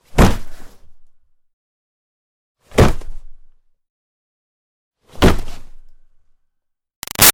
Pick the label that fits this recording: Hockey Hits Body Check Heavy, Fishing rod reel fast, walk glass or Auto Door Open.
Hockey Hits Body Check Heavy